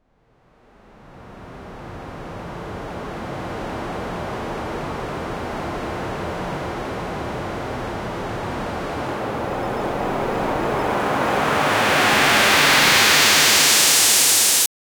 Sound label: cycle sound of waves clea; game effects